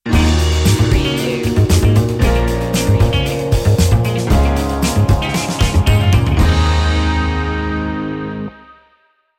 funky jazzy flow stinger

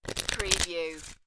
Cards Playing Cards Shuffling

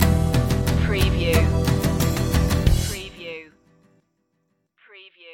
happy journeys stinger

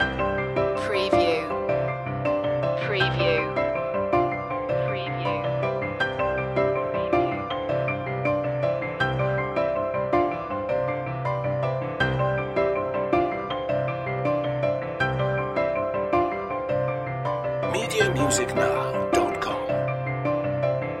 pulse loop

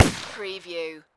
Pistol Rhuger 44 Magnum Single Shot Designed

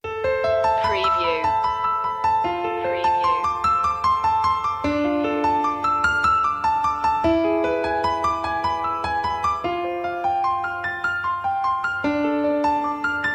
motivational notes loop intro; media music now

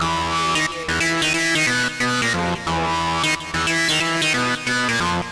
Hip Hop Synth Licks 90 BPM - E - Swing

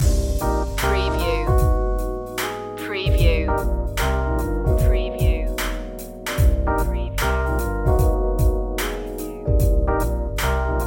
blue funk loop

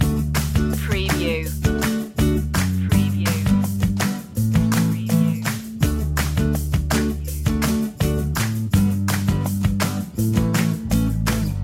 good day loop

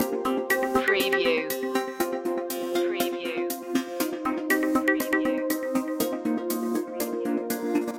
smart beat loop

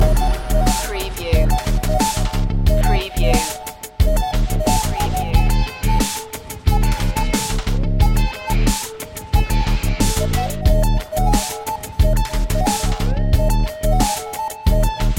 land attack loop

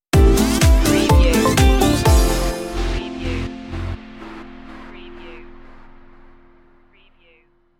summertime beats stinger

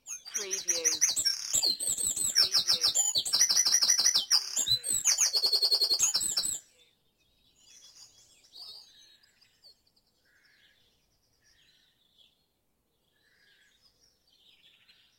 Dawn Chorus in Mold North Wales - Short Loop

